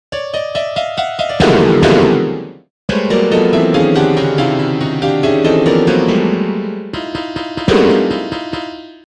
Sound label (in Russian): kak zvucit 18 na pianino